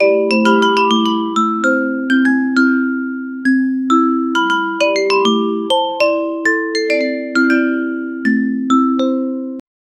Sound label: whimsical and happy backg, cozy